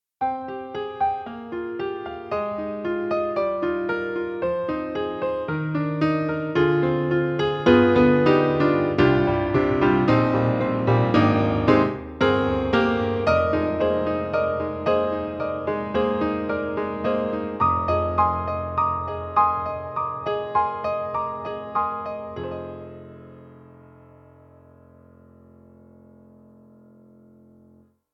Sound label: Steinway B Close Mic; Orchestral Tools; The Orchestral Grands